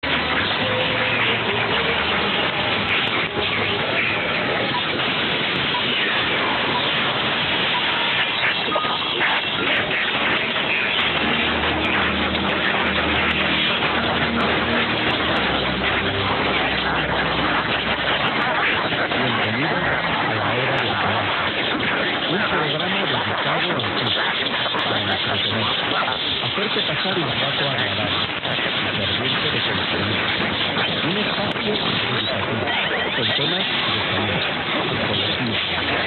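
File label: Radio Inter Spain with pips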